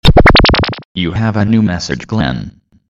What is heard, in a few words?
Message Tone